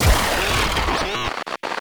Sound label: Holographic Illusions Ability Cast; Infested Liches DJ Rom; Warframe